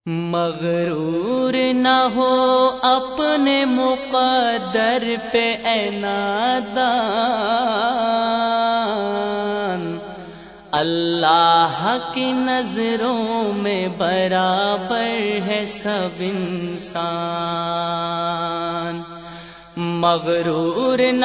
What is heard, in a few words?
naat